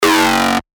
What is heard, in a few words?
Acid Shot F#; synth shots tech house; Hybrid; revealed